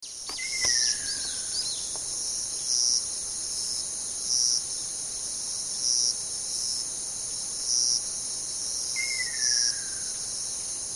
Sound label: akasyoubin; nakigoe